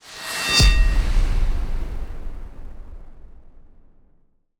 Epic Metal Hit; soundscrate

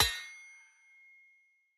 Sword Clang; soundscrate

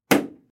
balloon pop

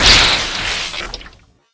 impact glitch; soundscrate

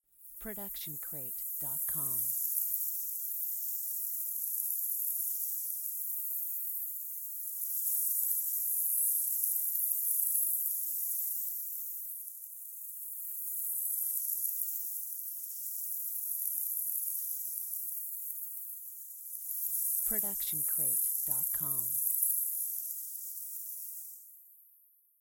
Magic Portal; SoundsCrate

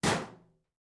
Metal Footfall; soundscrate

